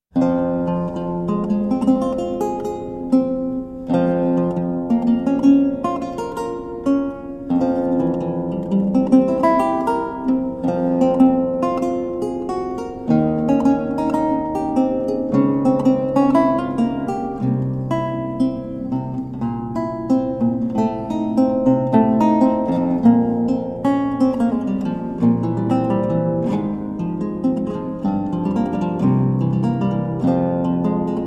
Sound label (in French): Prelude en fa mineur; Suites en do mineur et fa mineur de Sylvius Leopold Weiss